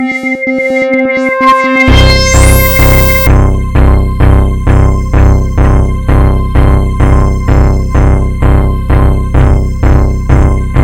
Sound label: Reason EDM 8 Bar Song